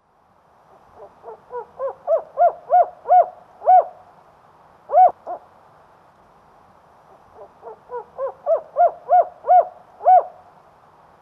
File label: Spotted owl